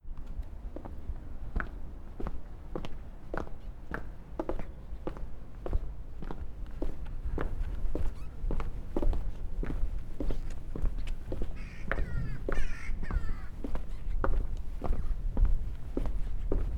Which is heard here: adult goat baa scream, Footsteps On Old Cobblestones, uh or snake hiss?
Footsteps On Old Cobblestones